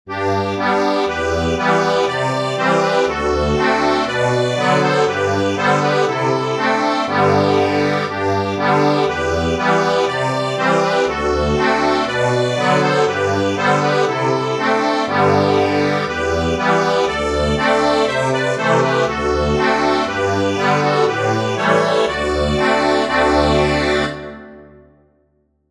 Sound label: ACCORDION; Jesus Christ is risen today